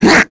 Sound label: Vox Jump; Nia; Kings Raid